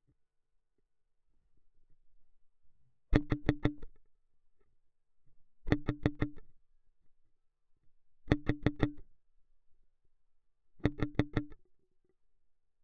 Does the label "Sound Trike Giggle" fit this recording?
No